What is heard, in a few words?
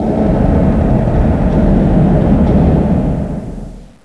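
guns reverb, battle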